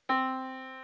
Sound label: yamaha Disk